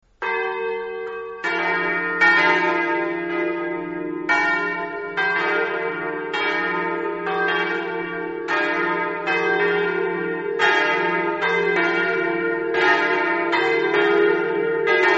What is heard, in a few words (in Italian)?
campane; pozzalis